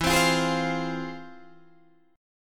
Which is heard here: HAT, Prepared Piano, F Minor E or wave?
F Minor E